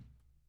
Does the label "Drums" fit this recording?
Yes